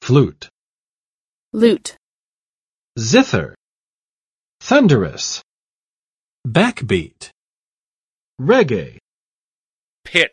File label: flute; Trekking Through Morocco 体验摩洛哥的多元文化; 长笛，横笛